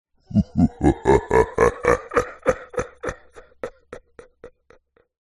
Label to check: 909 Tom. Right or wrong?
Wrong